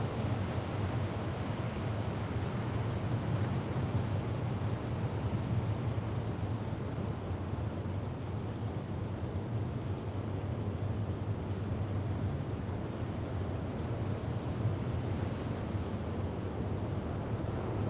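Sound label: exterior ambience hurricane winds through trees; sonniss gdc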